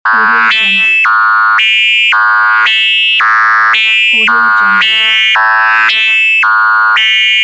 faulty alarm

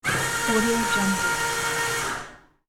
automatic paper towel dispenser working; hollywoodedge

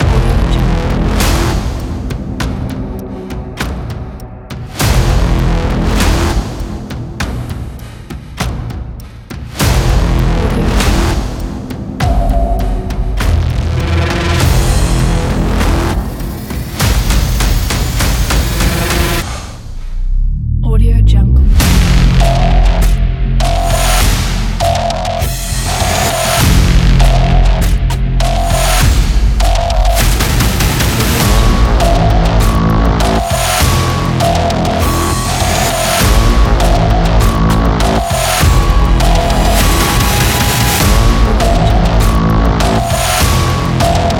apocalypse glitch

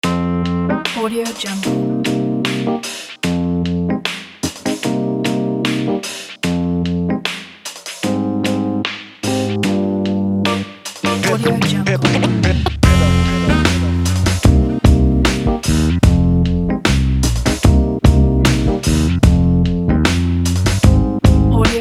jazz background

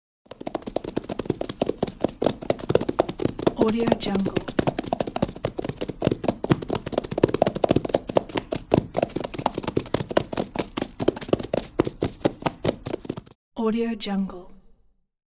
horse trots on soft ground; hollywoodedge

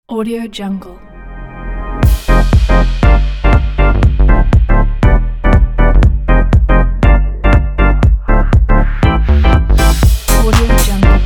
house fashion

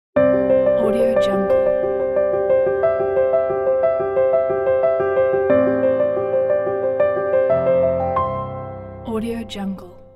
beautiful piano logo

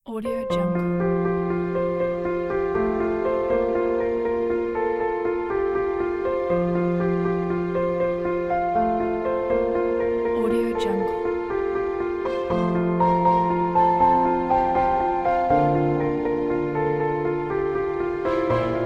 inspiring emotional uplifting piano

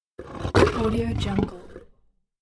lion roar; moonaudioproduction

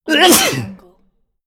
man sneezing

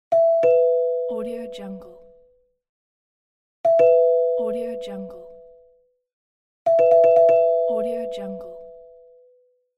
doorbell; tibasound

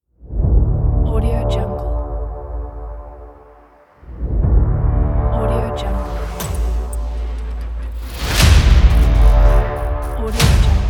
aggressive horror trailer teaser

